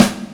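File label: Drum Hit Clap Snare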